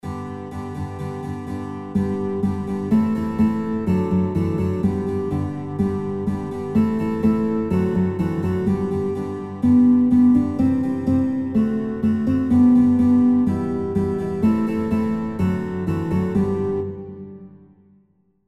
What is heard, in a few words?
Guitar; Guitar Songbook; Gruen Gruen Gruen Sind Alle Meine Kleider